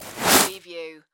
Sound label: Object Drag On Dirt; sound-effects-bible